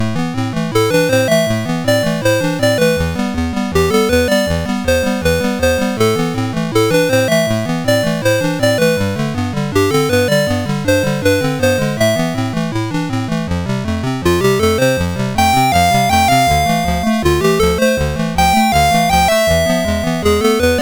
Demo Music, MOS6581R2

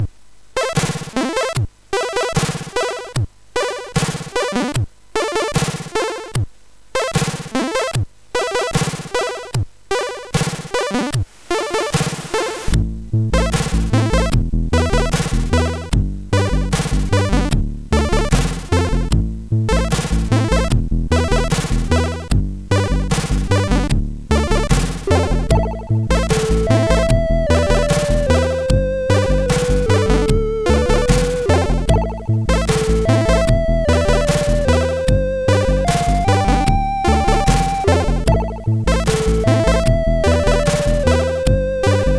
Laughter MOS6581R4; hvsc

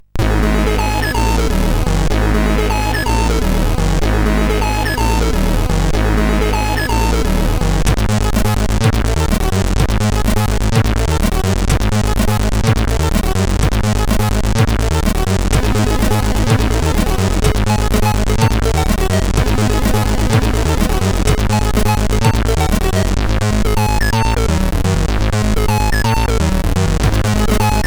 Technobeat, sid CSG8580R5; hvsc

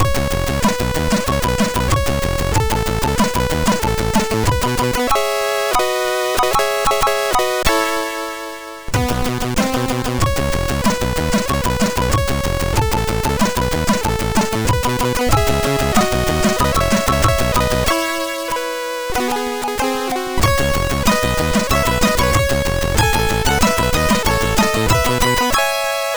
C Moll sid MOS6581R4

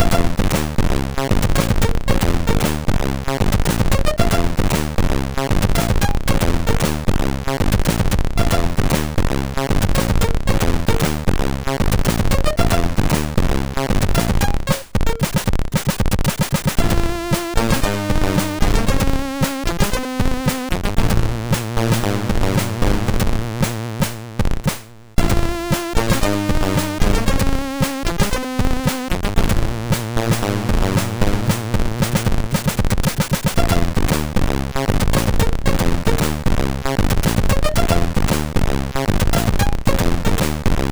tune MOS6581R3; hvsc

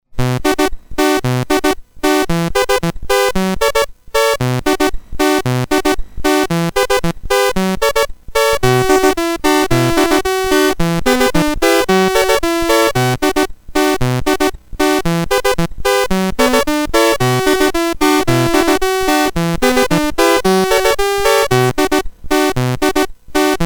Computer Shake BASIC, MOS6581R4

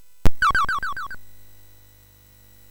Shuffle, MOS6581R3

